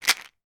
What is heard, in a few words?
household vitamin tablets shake inside container